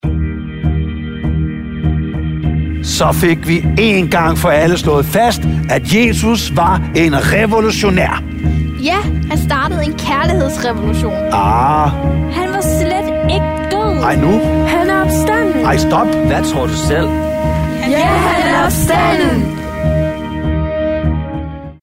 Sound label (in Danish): Drama musik og drama